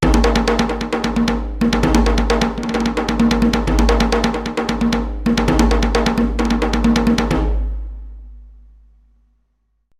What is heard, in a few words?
DJEMB DROO; eShop